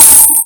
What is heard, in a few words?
Coins